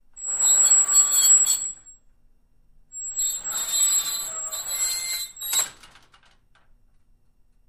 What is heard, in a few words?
Door Accordion Sliding Metal; Double; Open And Close 3x, Very Squeaky, Slow, Medium And Fast; Metal Gate